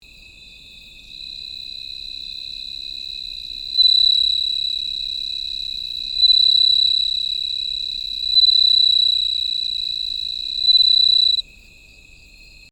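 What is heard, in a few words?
cicada suzumushi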